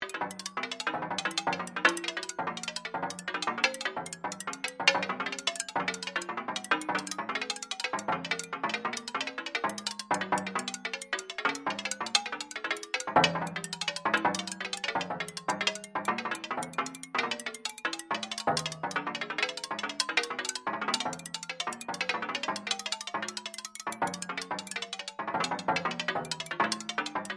Created Ambience Trash Gamalan Loop, Artificial Sound Effects; Various Ambience created through sound manipulation in FMOD